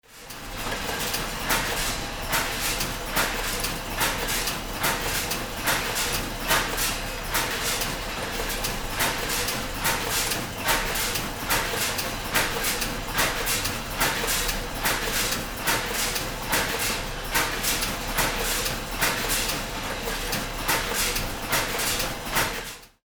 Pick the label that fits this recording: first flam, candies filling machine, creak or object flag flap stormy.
candies filling machine